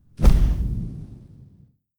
Explosion

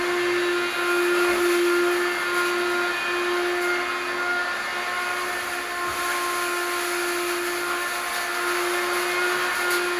Vacuum Cleaner

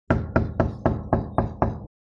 Imp Knock Door

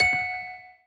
Toy Piano

